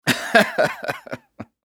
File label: laugh chuckle male